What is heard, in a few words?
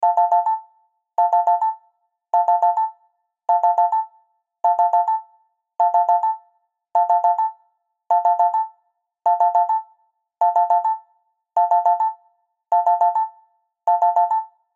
cell phone ringing